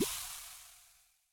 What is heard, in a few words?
Tech Bubble Fizz